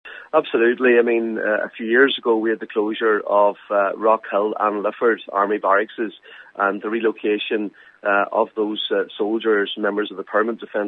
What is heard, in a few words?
pad